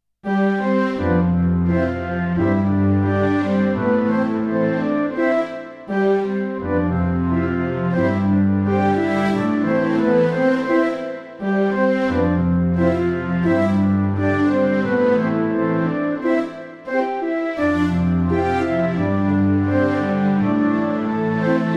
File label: jingle